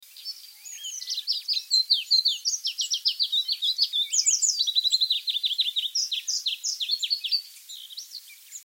kungsfågelsångare

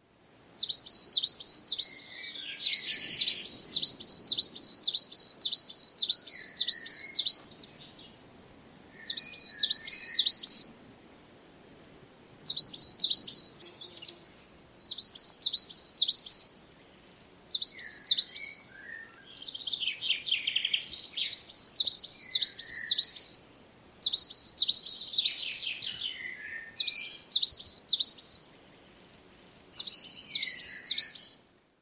svartmes

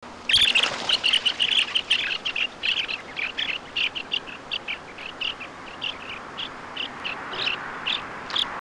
tuvsnappa

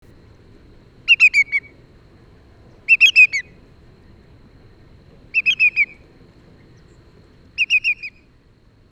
storre gulbena; ToddWilson

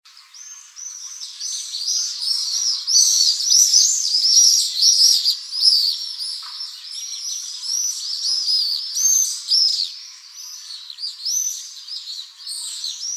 Blek tornseglare

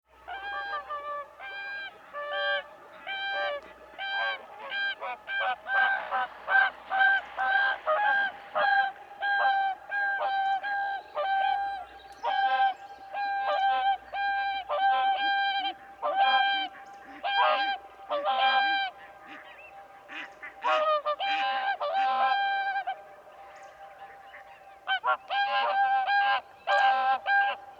Sangsvan

